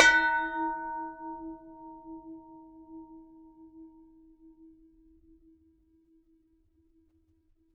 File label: FX BELL; Univers Sons - Raricussions